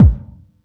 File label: Yamaha EX-5